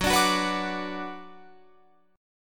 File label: F sharp Suspended 4th Sharp 5th, Guitar, Open F; strummed; 18,21,18,18,21,21